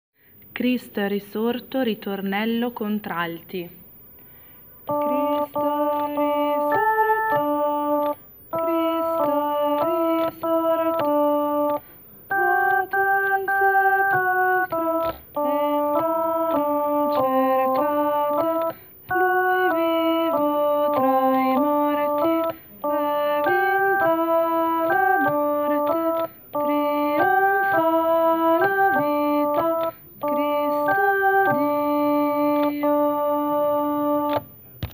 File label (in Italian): ritornello contralti; Cristo è risorto